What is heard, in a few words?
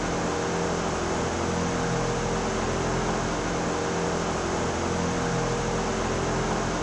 Generator